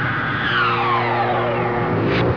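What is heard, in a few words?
MOTOR STOP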